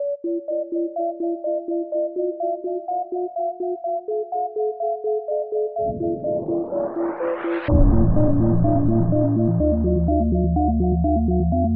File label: keygen song